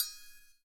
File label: CYM, Ethno Techno; ILIO - Ethno Techno